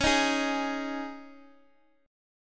C sharp Suspended 2nd C sharp down Guitar Open G

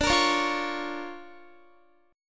D Minor Major 7th Sharp 5th, D, Guitar; DmM7#5; strummed

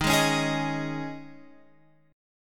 D sharp 7th Suspended 4th, Guitar, Open F

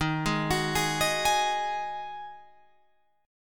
Eb Minor Double Flat 5th Eb down Guitar Open F; Ebmbb5 arpeggiated; JGuitar

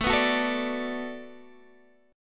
D 6th A, chord

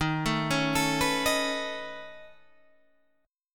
C sharp 7th Suspended 2nd Sharp 5th D sharp

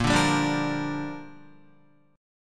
Bb 6th, Guitar; strummed; 6,5,5,7,5,5

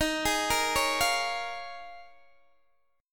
D sharp 9th Suspended 4th D sharp, Guitar DADGAD

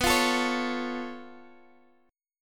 Db Augmented 7th B

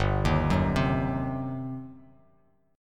G Major 7th Suspended 4th Sharp 5th; arpeggiated

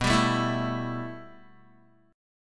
B 7th Suspended 4th Sharp 5th, Guitar; B7sus4#5; strummed

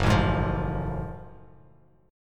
F sharp Minor Major 9th, Bass Standard; strummed; down

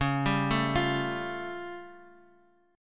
A Augmented 7th Db

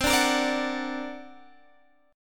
D Augmented 7th C, guitar

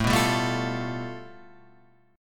A 7th Suspended 2nd Sharp 5th

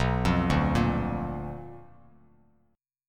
B Minor Major 7th Flat 5th Bass Standard; arpeggiated; JGuitar

